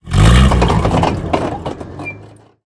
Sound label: tank engine load; War Thunder